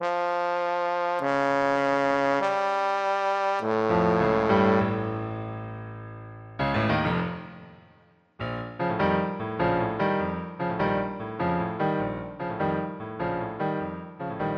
uranus, trombone

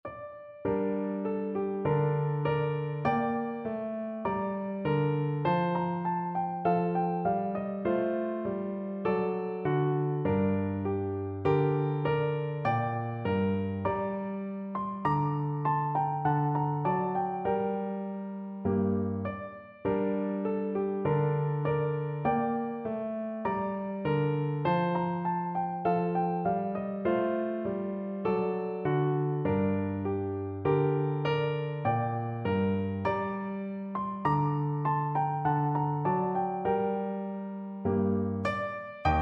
piano

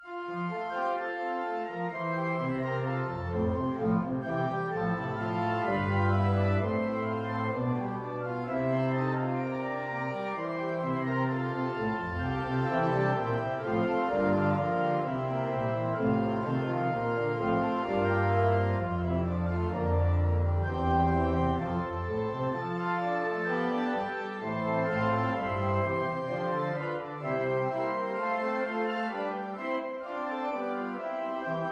organ

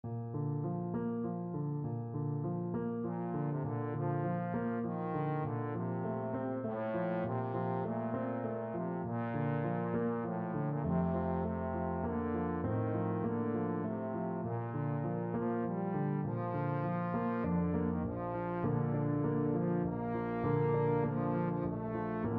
bold princess royal, trombone

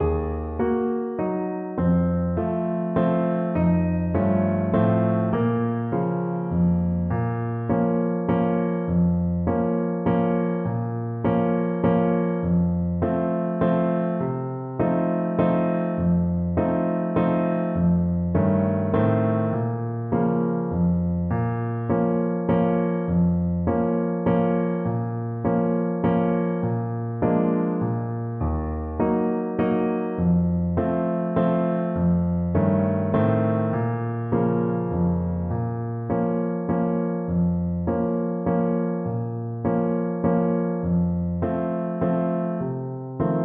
tumbalalaika, Trumpet

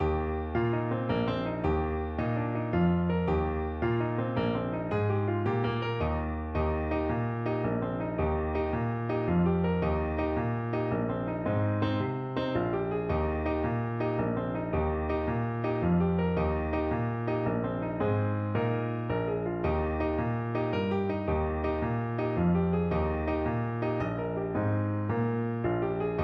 jig, Saxophone

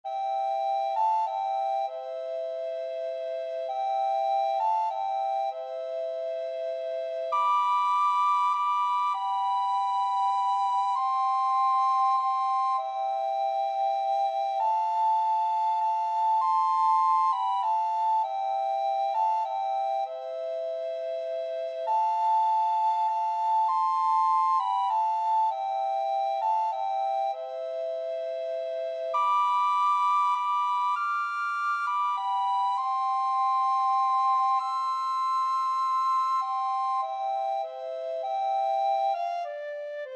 silent night, recorder duet

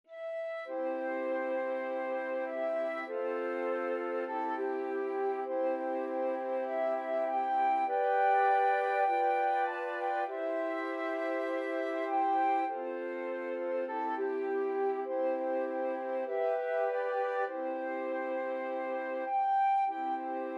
swing low flute

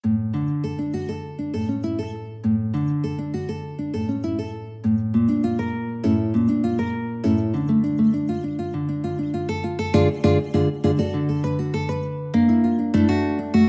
rag, guitar; maple leaf

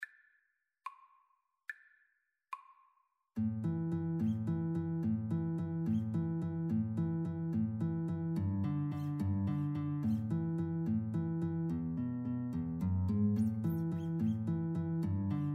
Guitar Duet

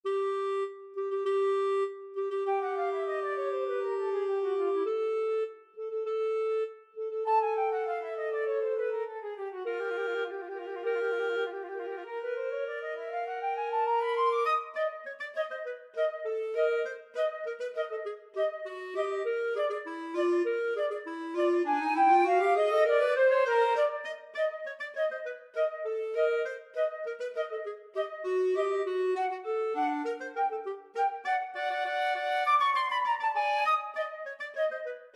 flute clarinet duet

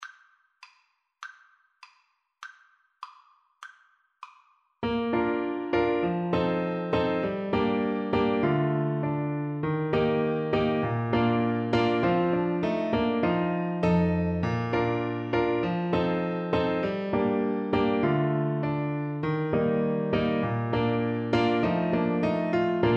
clarinet

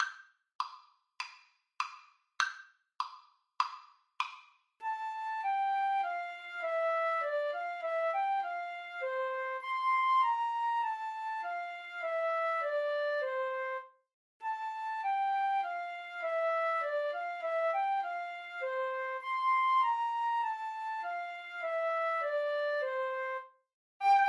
schumann melody, flute duet

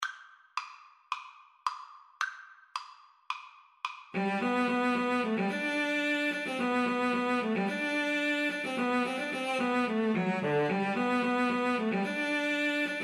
Cello Duet

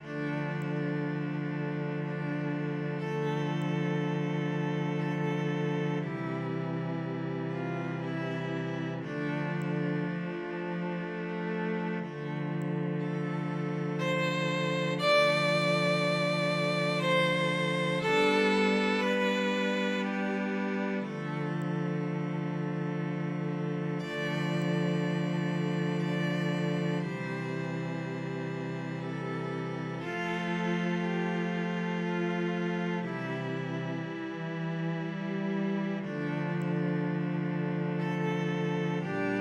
scarborough fair, 2 violins 2 cellos

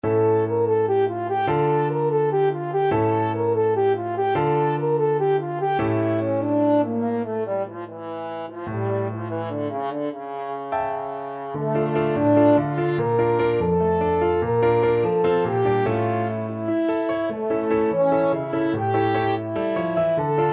Zenska Siptarska Igra, french horn

